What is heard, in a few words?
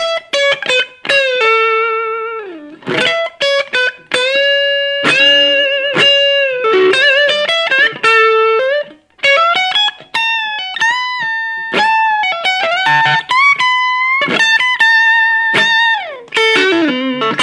a quick blast on the new strat